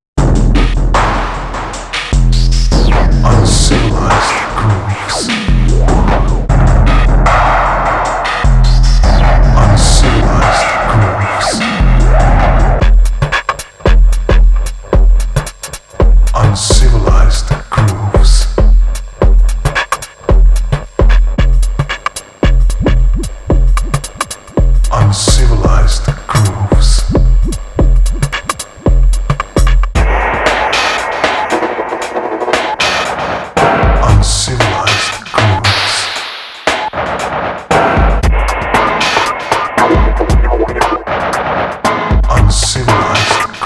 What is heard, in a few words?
Grooves; Uncivilised Grooves; Medley; BeatStars; sample libraries